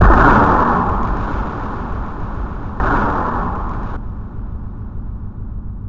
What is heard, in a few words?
loop, techno atmos